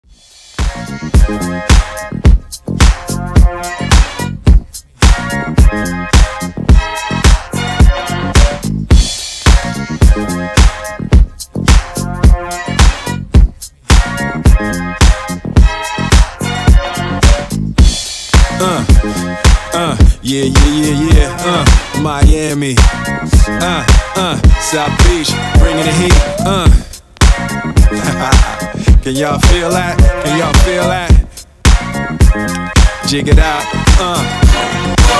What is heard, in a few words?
Re drum